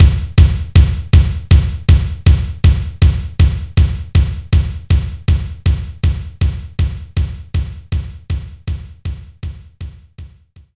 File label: Power Kick; Knights Try